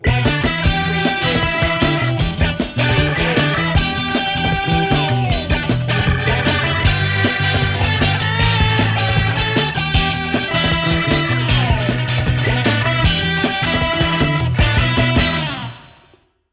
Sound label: uplifting rock